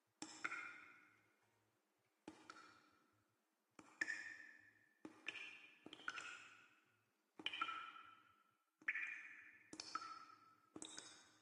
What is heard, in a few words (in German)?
kanal tropfen; minecraft